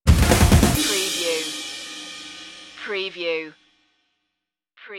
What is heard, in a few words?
dorm room stinger